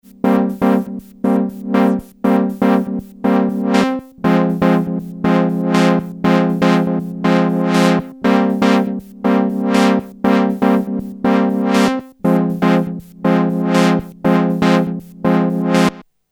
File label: matrixbrute2 3 voice